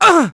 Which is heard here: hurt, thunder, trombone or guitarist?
hurt